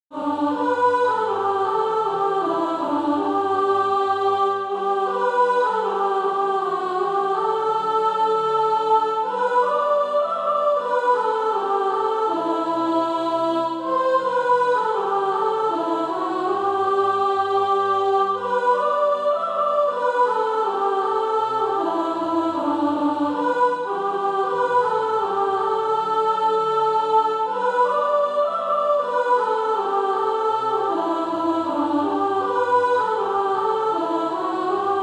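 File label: soprano; Do Something for Jesus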